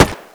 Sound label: m3 supergrease; WAR